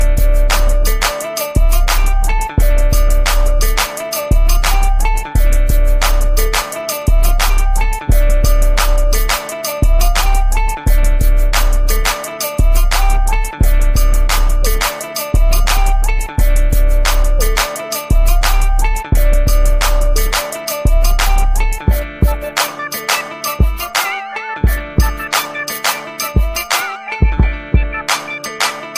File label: Boom Boom Clap